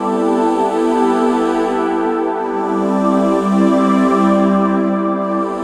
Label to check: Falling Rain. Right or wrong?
Wrong